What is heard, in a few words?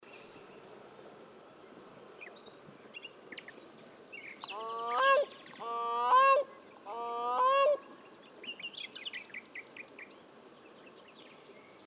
CORONATA; Gru; Grey Crowned Crane; Balearica regulorum; call; Busatello, Verona, Italy; Marco Pesente; Verona BW